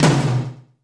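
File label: POWER KITS, tom; 90 sSampleCDs; Crypton - DrumBase5000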